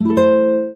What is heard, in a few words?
Classic Guitar, notifications